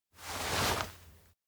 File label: turn face; anemoi パッケージ版